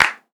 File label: Foley